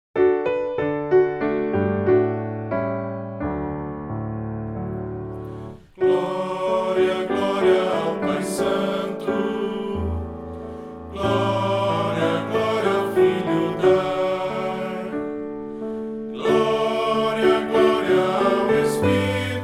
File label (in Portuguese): voz pian